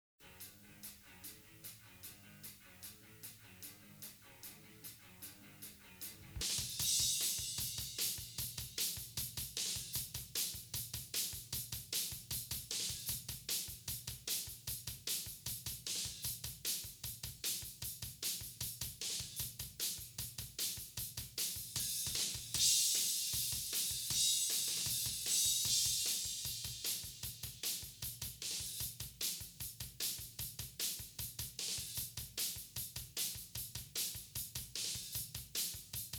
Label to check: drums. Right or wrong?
Right